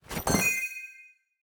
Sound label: item drop gem world